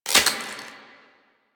Omicrus Quick Draw Mech; Warframe; Quests; The New War; Weapons

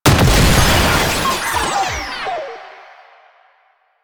Tank Drone Explosion; Enemies; Abilities; Lasrian; Warframe

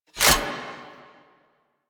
Rocket Launcher Reload; Weapons; DE; Warframe; Tenno; Lasrian

